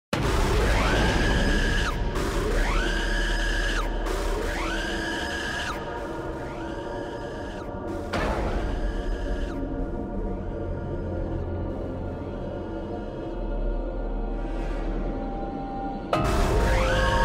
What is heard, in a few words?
bgm